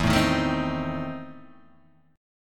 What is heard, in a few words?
E Major 7th Suspended 4th Sharp 5th, Guitar, DADGAD